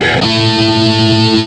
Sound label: klavier damage